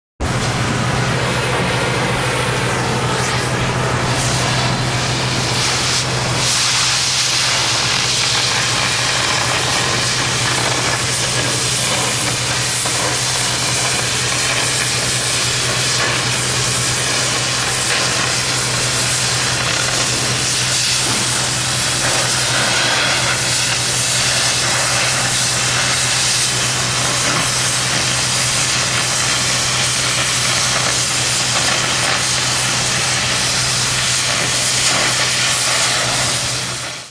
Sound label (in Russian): vozdushnyj kompressor